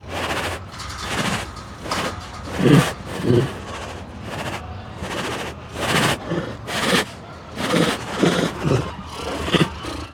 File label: Tiger Huffs, Puffs, Sniffs and Snorts; Tigers; Sound Ideas